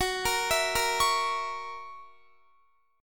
Gb 7th Gb down Guitar, chord

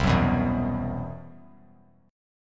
C Major 7th Flat 5th C; CM7b5 strummed; JGuitar

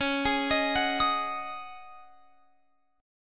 Db Suspended 2nd Suspended 4th Db Guitar Open F; arpeggiated; x,20,20,22,21,22

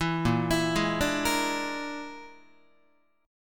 A sharp 7th Flat 5th, Guitar chord; A#7b5 arpeggiated; JGuitar

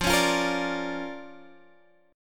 D Minor Major 7th Sharp 5th F, guitar

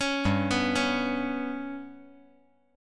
G Major Flat 5th chord

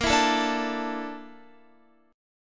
Bb Major 7th Flat 5th, Guitar; strummed

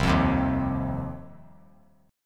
C Minor Double Flat 5th C down 3C 3F 3A 3D; Cmbb5 strummed; JGuitar

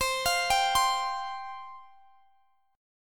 C Suspended 4th C, Guitar, Open G

